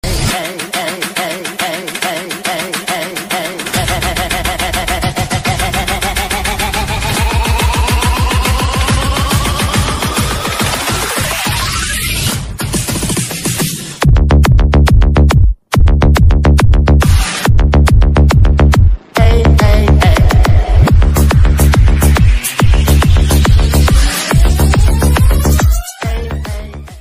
psy ringtone psy trance ringtone psy bgm trance bgm